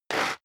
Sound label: step leather shoes concrete grit slide short; schritte